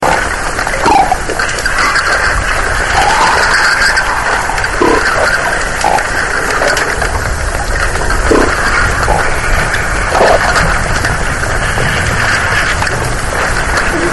Hippos In A River

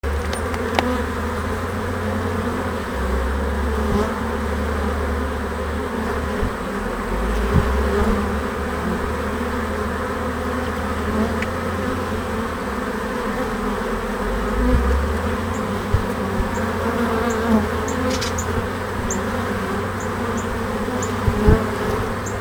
bee hive

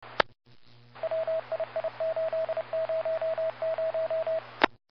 morse static

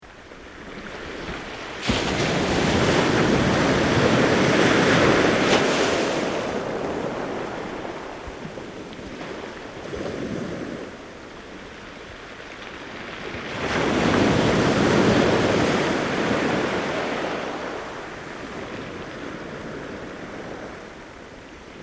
waves on beach

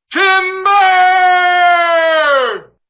timber

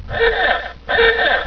ahooga

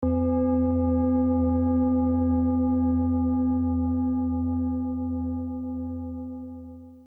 buddhist bell

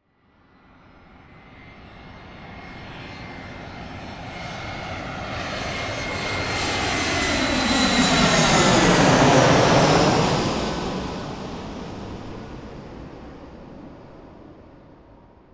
airplane fly over

